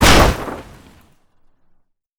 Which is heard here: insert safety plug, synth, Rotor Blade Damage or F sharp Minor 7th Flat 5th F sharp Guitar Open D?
Rotor Blade Damage